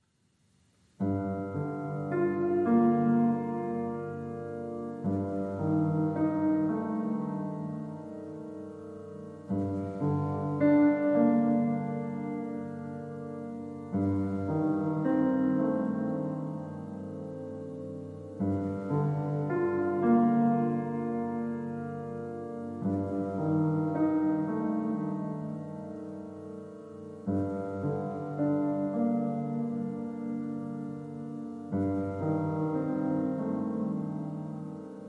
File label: Only Piano